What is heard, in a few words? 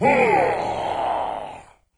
melee; doom3; commando